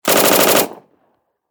Submachine Gun Thompson 9Mm Long Burst Distant Perspective; Warfare; Machine Guns